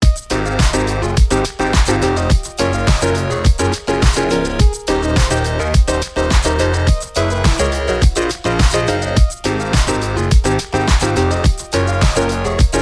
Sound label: chords